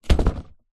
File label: foley cardboard box parcel wrapped paper set down thud